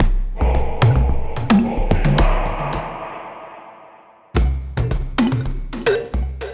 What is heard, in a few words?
basicMood Cueva Bosque